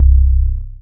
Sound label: DM BAS, Dance Mega Synth; Best Service